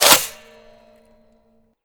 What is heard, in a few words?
chamber, weapons m82; Urban-Coalition; Anarchy; arccw ue